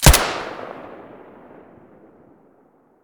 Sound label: silencer mono, weapons